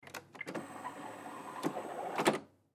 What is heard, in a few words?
Printer Off; Printer Copy Machine Push Button Beep Turn Off; Printer Hewlett Packard Copy Machine Push Button Beep Turn Off; Office; Blastwave